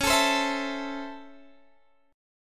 C sharp Minor Major 7th Sharp 5th, Guitar, Half Step Down